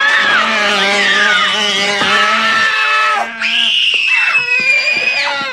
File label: bees and kids scream